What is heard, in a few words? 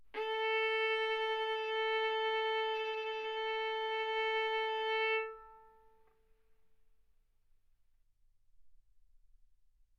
Violin arco A4 B4